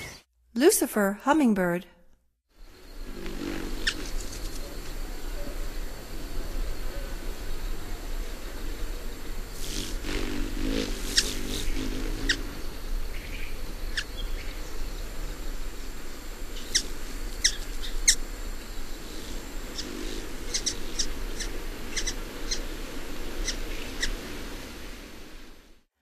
Lucifer Hummingbird, Songs of Birds of North America; Stokes